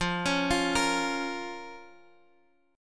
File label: F Suspended 4th Sharp 5th F down Guitar Half Step Down